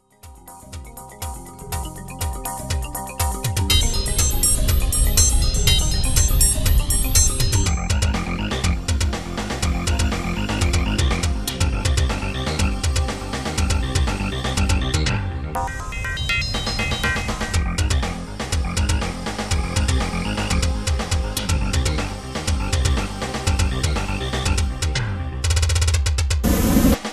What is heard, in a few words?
tribal